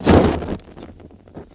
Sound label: Flame Burst Whoosh